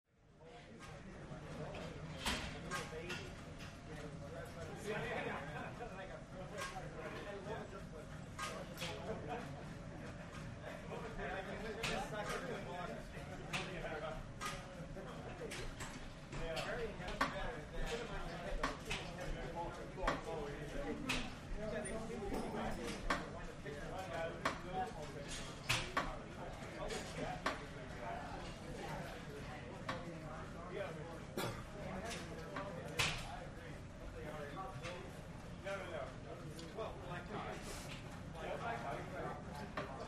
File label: Gym; Weight Room Ambience With Machine Movement And Male Walla.